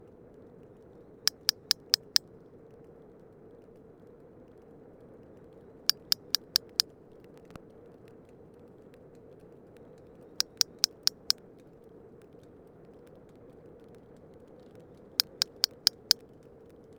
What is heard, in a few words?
codas